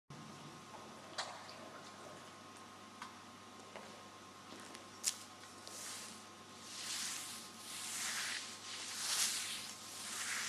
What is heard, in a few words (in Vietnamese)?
tieng lau chui san nha nha cua